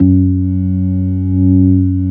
ORG B3, organs; Sound & Vision; Gigapack I CD 2

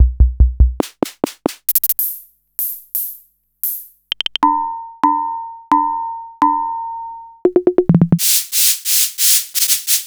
Vermona DRM1

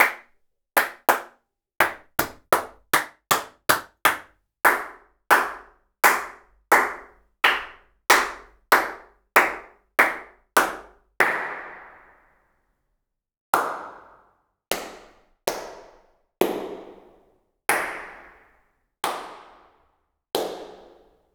hand sounds claps; Sliced

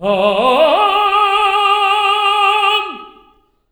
TENOR ARP G#3

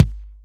Rap Kick, Alesis HR16B drum machine; 300 Drum Machines

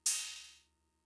Cymbal; Fricke MFB-522

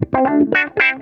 DIZZY, Funk Guitar; 90 s Sample CDs; Zero G

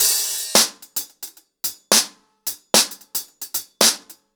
110BPM, acoustic drums, drumloops

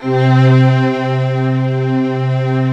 LUSH STR C3 R, KORG, Analog; USB Soundscan vol.49; Analog Fantasies; AKAI

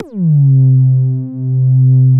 OB A3 -R, Rare Synthesizer; Hollywood Edge - Giorgio Moroder Rare Synthesizer Collection; OB 4 VOX.

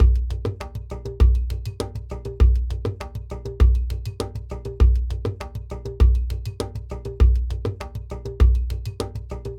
Ensemble, Latin Percussion

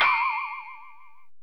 TWING, TECHNO; Drums In Your FACE!

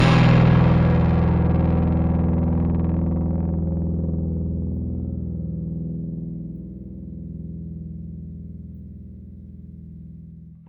Rickenbacker Bass; Airwindows; Rick-Xtras

